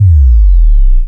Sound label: jungle sine, jungle bass